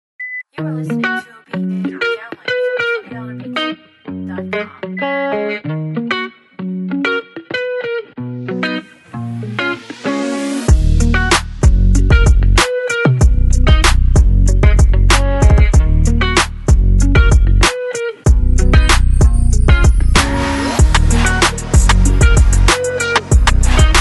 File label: Pop beats